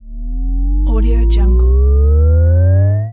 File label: energy power up